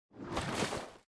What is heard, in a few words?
Forest SFX, Eater Rise